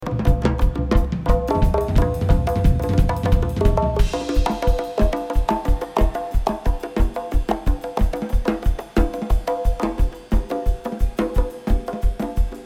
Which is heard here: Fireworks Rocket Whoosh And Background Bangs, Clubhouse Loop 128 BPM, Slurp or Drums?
Drums